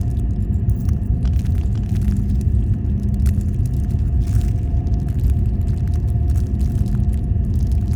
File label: ship transport idle; Stellaris; biogenesis; ships